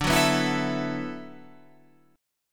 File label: D 6th Add 9th D, Guitar, Standard; D6add9 strummed; 10,9,9,9,10,12; JGuitar